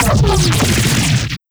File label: Bass Effect